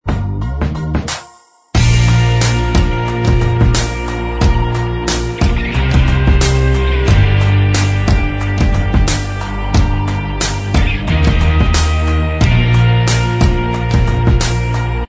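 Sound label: instrumental track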